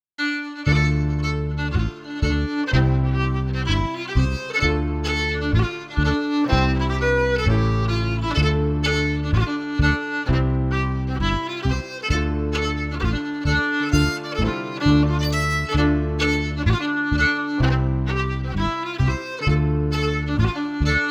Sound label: FIDDLE PIANO; Jink & Diddle Book o' Tunes 2022